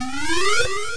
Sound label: appearing; mazeofgalious